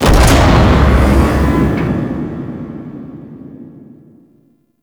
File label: Galleon Gun Fire; Warframe; Ambience; Grineer Galleon; Galleon Props